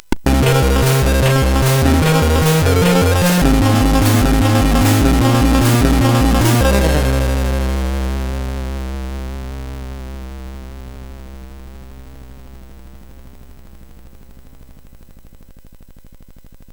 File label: sid MOS6581R4, GAMES; Cloud Kingdoms